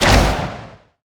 Crossbow Mineral Cast; bullet

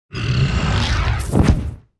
Die, Mob; Rust

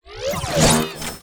CUTTER WAR ATTACK; Mob

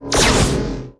Lob Lava attack

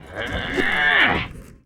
WAR IDLE; Mob

